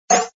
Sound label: Button Click; moonstone madness